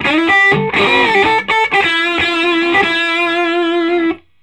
SOLO E, Vintage Blues Guitar

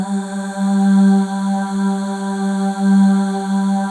Voices, FEM AHS; Spectrasonics; FEM.2 AHS

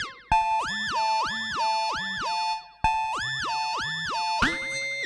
Disto Stomp Mix B 95 A, modular 95bpm

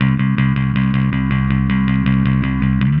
Happy Pub Bass

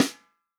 REAL DRUMS FUNK KIT

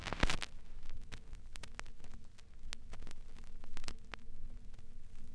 Stylus Down, Vinyl Noise; noise hiss crackle

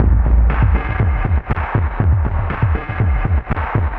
Processed Perc; Sonic Foundry (Sony Creative Software); Electrocution; Beat Components

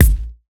Kick, Dance Drum Kit; Drum Oneshots; Twilight; Monosounds

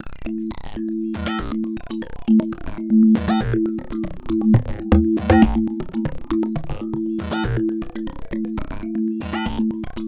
Lo-fi; Ethereal Textures; Otherwordly Rhythms; Sonic Foundry (Sony Creative Software); Ma Ja Le

